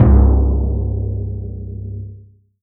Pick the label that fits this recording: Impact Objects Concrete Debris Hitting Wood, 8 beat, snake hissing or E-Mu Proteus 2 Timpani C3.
E-Mu Proteus 2 Timpani C3